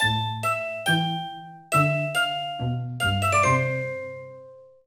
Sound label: Music Lose; Tales to Enjoy Little Red Riding Hood